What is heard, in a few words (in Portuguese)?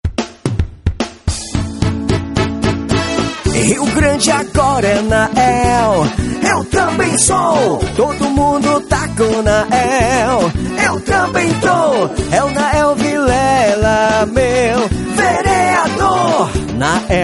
Jingle politico